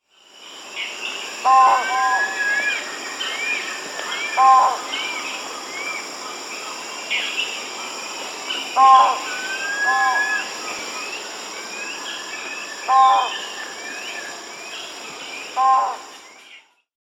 Broad-billed Motmot (Electron platyrhynchum), Peru; S. America